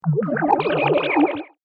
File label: Alien Regeneration; Alien Sound Effects; science fiction sound effect; Andrew Fleming; Twistedtracks